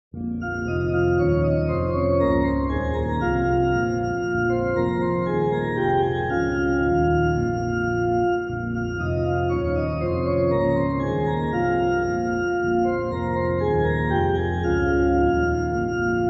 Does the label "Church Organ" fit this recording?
Yes